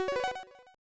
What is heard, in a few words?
Pause Jingle; pro mahjong kiwame 3; snes